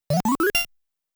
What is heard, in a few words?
Stage Flag -Appearance